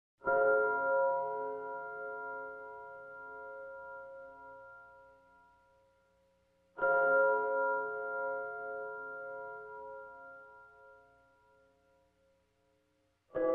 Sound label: mysterious bells